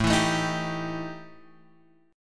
E Minor Major 7th Double Flat 5th A, guitar

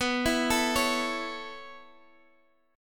B 9th Suspended 4th B, Guitar, Half Step Down

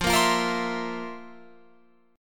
F Major 7th Suspended 4th, Guitar, Open F

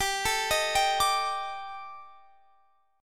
Eb Major 7th Flat 5th

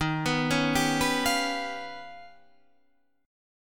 Eb Augmented 9th Eb down Guitar Half Step Down

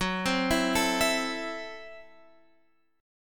Gb Minor 7th Flat 5th Gb Guitar Open F; Gbm7b5 arpeggiated; x,13,12,11,12,11

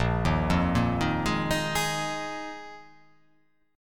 A sharp 7th Suspended 4th down

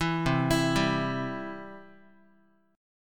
C Major, Guitar; arpeggiated; 7,8,7,5,x,x; 4A,4E,5A,5D,5G#,6C#; chord; JGuitar

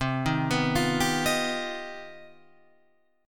C Minor Major 11th, Guitar, Open F; arpeggiated; JGuitar

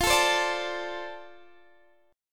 F 6th F down Guitar DADGAD; F6 strummed

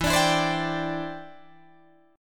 E Minor 7th Sharp 5th, down, Guitar, Drop D

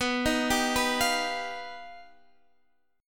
G Augmented 7th

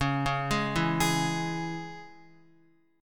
Db Minor, Guitar; Dbm arpeggiated; x,4,1,1,4,4; JGuitar

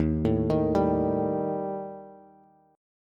Eb Major Flat 5th, Bass; EbMb5 arpeggiated; JGuitar

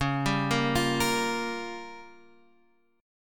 C sharp 6th Flat 5th C sharp down Guitar Drop D

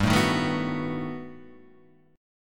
B 7th G; B7/G strummed; JGuitar

